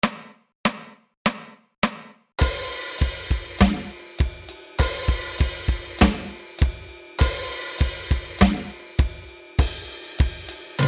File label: Drums Metal Dirge Jam, drums only